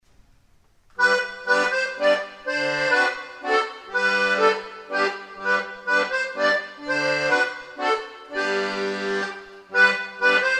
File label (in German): Slawischer Tanz